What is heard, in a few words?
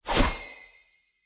Short Sword Throw; Weapons; Player; Swords; Sollos